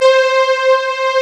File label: WARM B C4, D50; D50 STORY; East West; Global Sounds